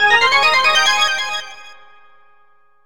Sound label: Key Collected; Diddy Kong Racing; Nintendo 64